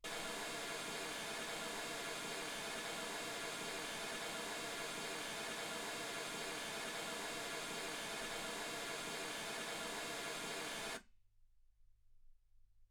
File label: mls16 48kHz